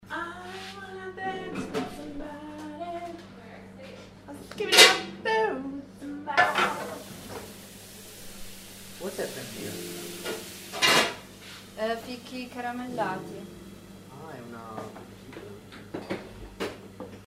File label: singing